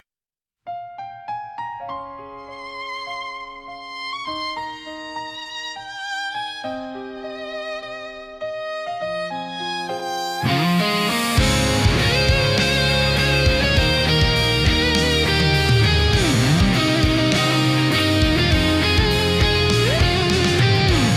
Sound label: quiet rain inst